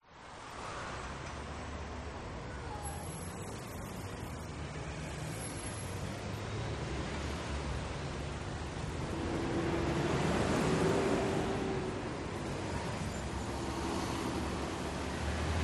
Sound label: Australia Traffic; audio hero